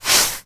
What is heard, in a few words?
industrial paintbrush single stroke on door